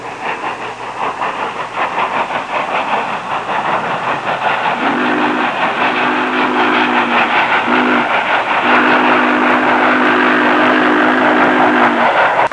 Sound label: diesel, transportation